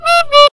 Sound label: broken bike horn